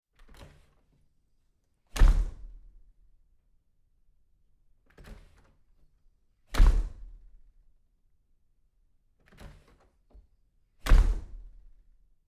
front door close

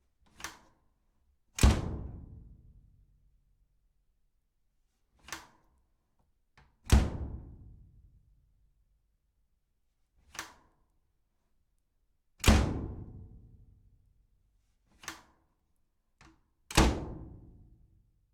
washing machine door

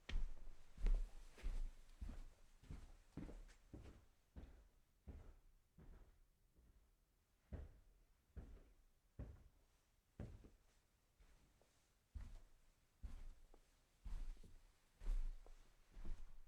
walking tile to carpet

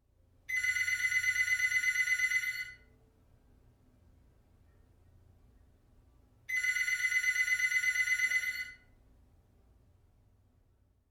phone ringing untraditional

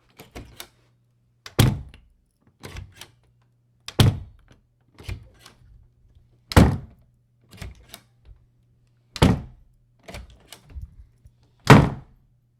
hollow wood door

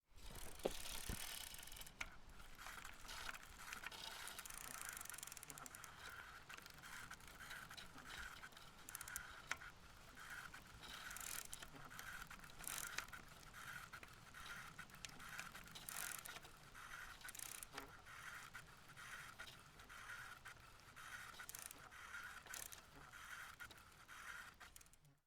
bicycle pedaling

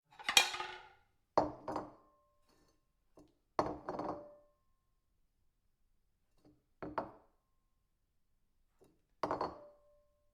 dishes laid on table

